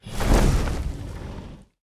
wings, enderdragon; mob; Minecraft; Votive World

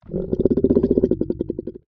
ambient, guardian; Minecraft; Conquest Default

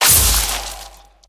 crystal stop; Minecraft; Votive Content

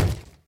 wood, zombie; mob; Minecraft; Votive World

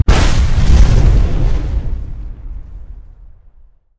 explode; Minecraft; Votive World

